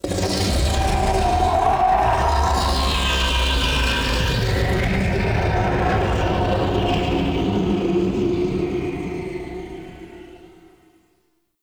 Misc Chasm Scream H; Ambience; Warframe; Man In The Wall; Entrati

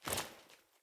Grunt Foley Melee Swing Armour; Enemy; Sinecure

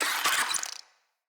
Infested Ashen Vocal Pain

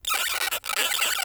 Forest Rodent Squak; Warframe; Grineer Forest

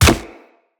Step Default, Footsteps; Infested; Charger

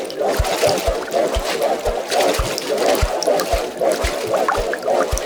Pool Noodle Throw Loop

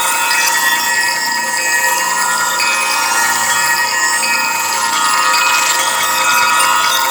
Detect Loop; Warframe; The New War; Deacons

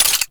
Zoom Out; Vulkar; Grineer; Female Sniper Rifle; Weapons

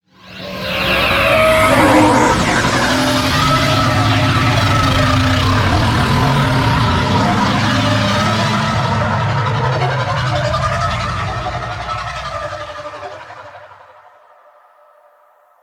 Level Undocking B; Vehicles; Flight; Warframe; WM Liset

